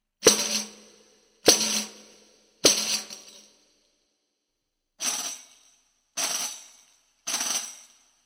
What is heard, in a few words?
Tamborine